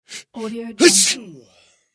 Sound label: man sneezing